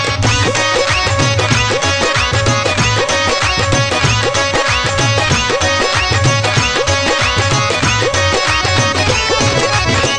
Desi Bhangra

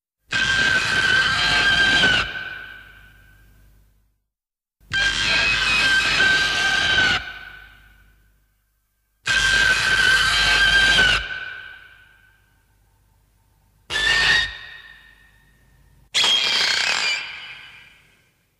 Roar; Ebirah 1966

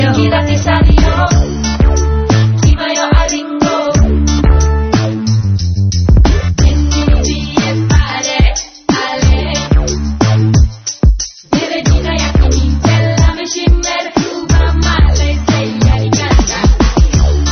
Jingel; Airtel

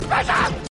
SPACESHIP

